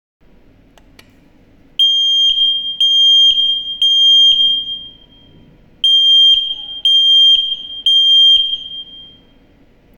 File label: alarm sound, rauchmelder